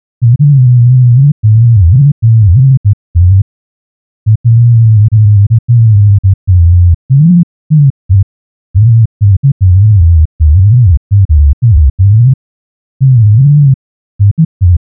sinus, pure sinus wave and global energy modulated sinus waves; PERCEPTUAL EXPERIMENT: sinusoidal stimuli